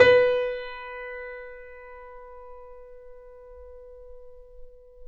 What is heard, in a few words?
Mf B 6, Grand Piano off mic; AKAI S6000 CD-ROM - Volume 2